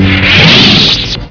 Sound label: Sabre off